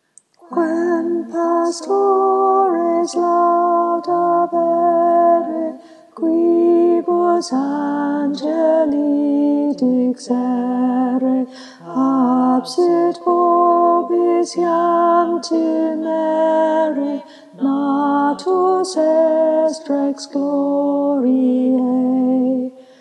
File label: Alto, choir; Quem pastores